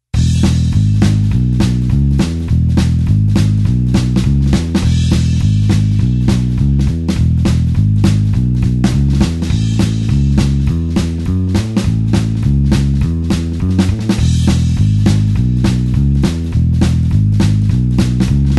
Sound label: blues bassline 4beat E